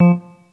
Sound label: Synth